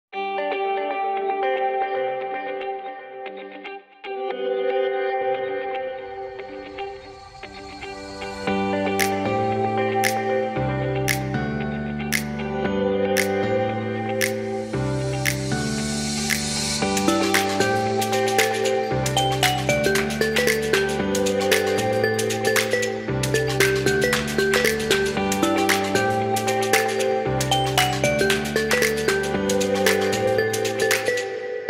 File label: Upbeat and Happy Background Music